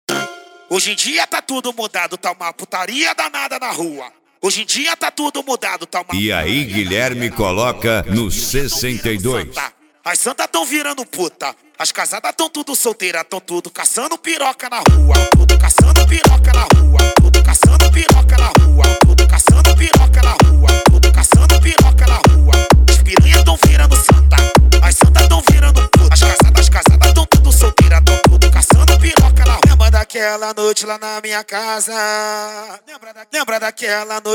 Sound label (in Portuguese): esp funk atack